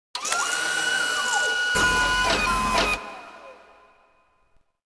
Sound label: City Noise; Sid Meier's Civilization 4 Complete; Beyond the Sword